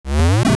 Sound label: Lift; Link lifting an object; Zelda